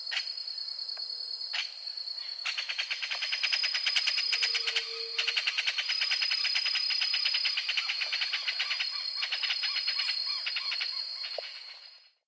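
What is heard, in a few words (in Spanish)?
martin gigante neotropical megaceryle torquata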